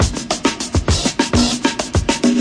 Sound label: 101 latin, drum loops and breaks